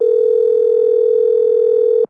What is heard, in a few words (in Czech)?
tuuuuuut; Mafie 1